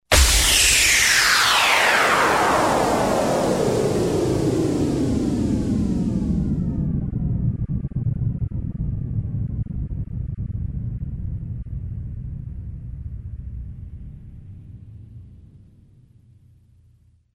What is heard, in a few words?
WHISTLE EXPLO SWEEP; RADIO STAR